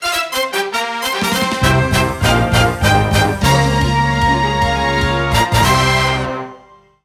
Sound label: Intro Jingle; Quiz Ring Match; Wii